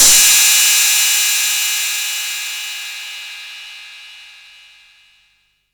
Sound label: drum crash sample d sharp key; Cymbal; one shot